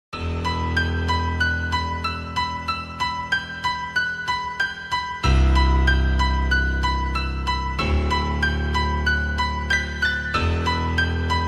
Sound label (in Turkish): turkce rap; tom clancy's rainbow six siege